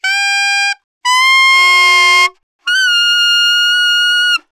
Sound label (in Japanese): Single Reed, 気鳴楽器; Saxophone